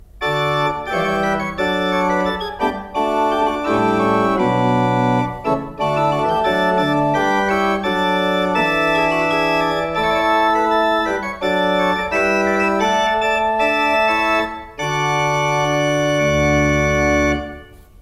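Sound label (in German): Grenzing 7 II P, pfeifenorgeln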